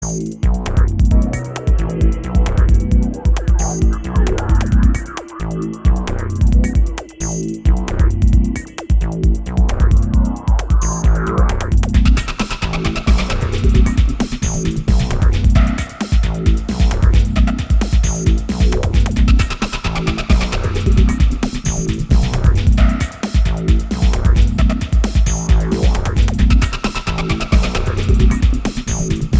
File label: Transition